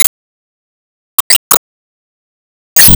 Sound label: Enemy Hourgrass Minion Heal